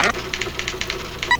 Typewriter Groove; Sound FX

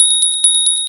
Belrng, Korg M1; Drum Samples

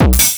oonce

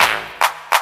bow clap clap

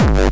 bombo, kicks, Hardcore

